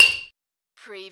Button Sound - Metal Jar Dink

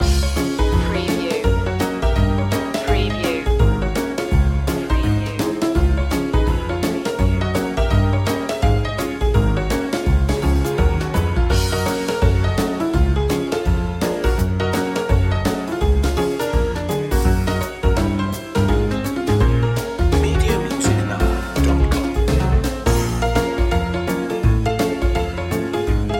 ragtime promenade

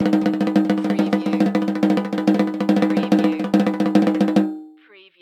Cartoon Drum Tympani Tap

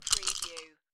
Dice Shake Dice In Hand

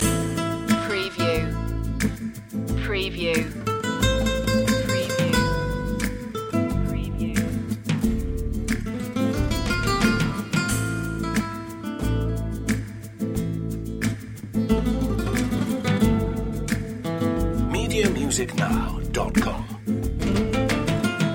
spanish storm loop

